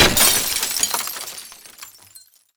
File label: glass falling; Escape from Tarkov